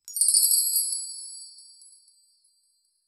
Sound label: sparkling shiny bells bac